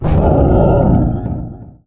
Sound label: boar, outdoor ambient